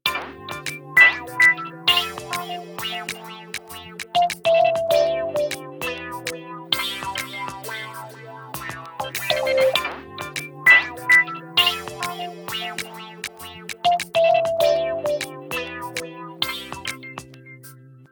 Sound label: Caress, ringtones; Explay; Golf